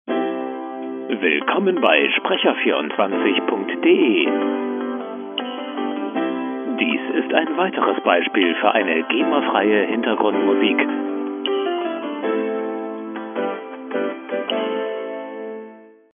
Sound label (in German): musik